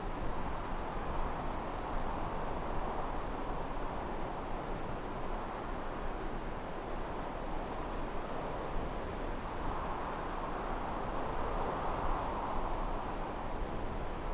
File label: ambience Blust Wind; Star Wars Obi Wan; XBOX; Theed